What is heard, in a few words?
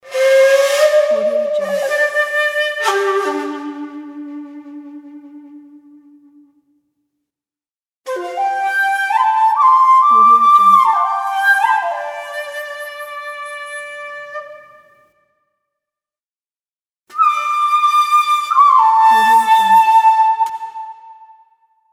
flute logo; flute logo pack